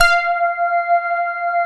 SYN ORGSY, SYN DIGITAL; Sound & Vision - Gigapack I CD 2

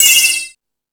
House Machine, E-MU Pro-Cussion

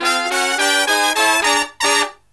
SLEEP, Killer Brass Riffs; USB Soundscan vol.29 - Killer Brass Riffs; AKAI

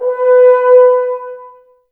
Brass F.Hrns; Sample CDs; Miroslav Vitous; Woodwind Brass Ensemble; F.Hrns Links

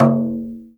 GENDANG G3, GENDANG DRUM; Spectrasonics; Heart of Asia

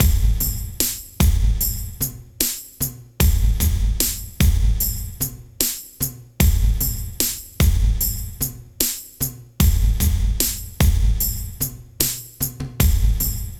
HIPHOP, Funky; INIS; Funky Xtreams

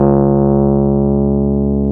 PNO, Electrics, Rhodes; Club-50 - Foundations Roland

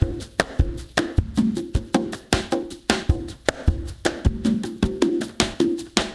ICE BRUSH REMIX, Liquid Grooves; Spectrasonics; Liquid Grooves 1 & 2

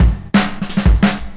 Breaks, 175; Drum&Bass; EZ Rollers

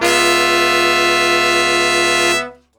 C# BRASS; E-MU Formula 4000 Series Vol. 1; Hip Hop Nation; Booty Brass

